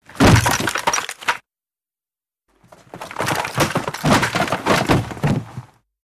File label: Body Fall Weapon; bodyfalls